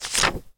page

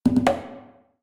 conga

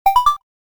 button

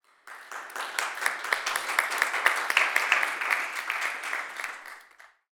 applause

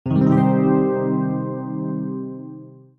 arpeggio

